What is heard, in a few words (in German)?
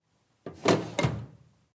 Schublade klein